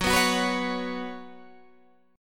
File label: Gb Suspended 4th Gb, down, Guitar, Half Step Down